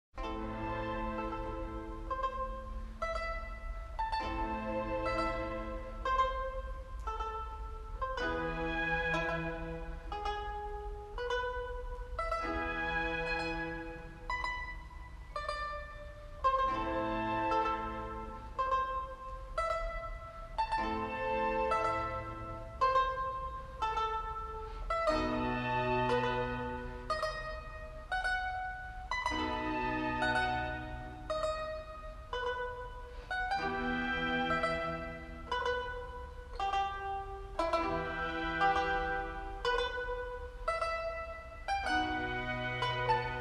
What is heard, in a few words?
Concerto For Mandolin, Strings & Harpsichord In C Major - Largo